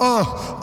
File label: uh male echoey; ooh ahh; uh um